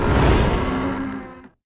Wisp Shoot; Risk of Rain

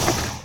lizard hit; Risk of Rain

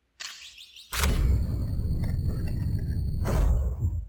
boss door open; HLD

